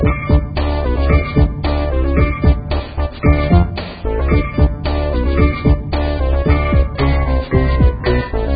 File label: theme loop; nickelodeon game; nanochase